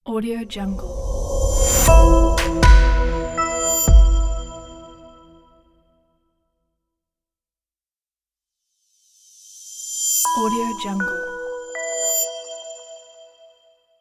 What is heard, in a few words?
reveal logo sound